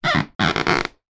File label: wood creak floorboard; foley; sound effects; sound effects one